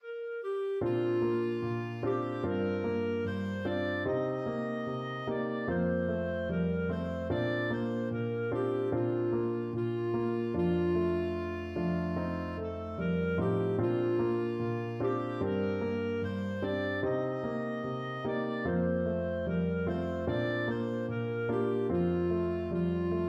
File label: the singing bird, clarinet